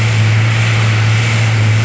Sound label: jet; Mafie 1